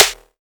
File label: clear clap G# key; percussion; single hits one shots